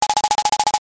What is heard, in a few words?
lever